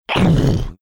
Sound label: making can crusher sounds